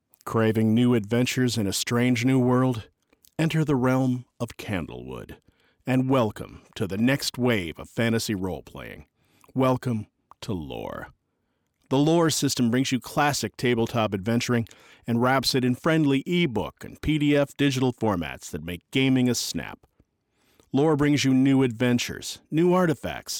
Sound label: Vocal